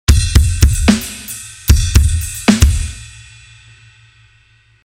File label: Full Drum Loop 150